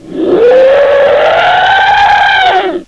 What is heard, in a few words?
sawrunner attack